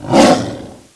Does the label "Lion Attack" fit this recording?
Yes